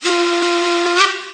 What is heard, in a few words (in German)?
D Pfeife 2 lang